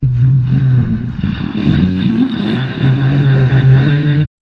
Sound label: jingle